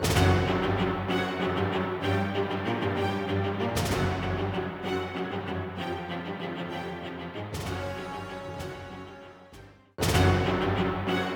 Battle Fade; Might and Magic Clash of Heroes

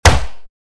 bullet impact wood heavy; Out of Reach: Treasure Royale

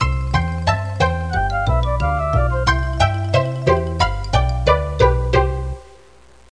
Transition; Reader Rabbit Thinking Adventures Ages 4-6; Macintosh

